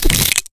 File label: Cell Miss Sound; Jolly Battle